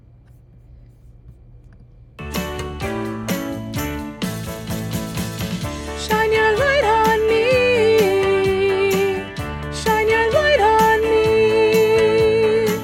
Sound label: solo only; all school show